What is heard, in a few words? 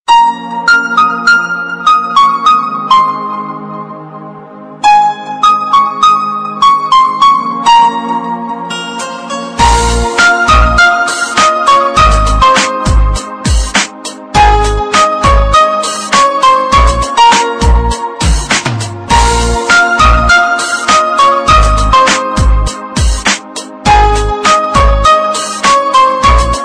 indian pop remix